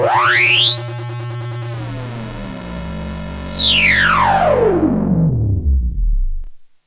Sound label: Monotron Sci-Fi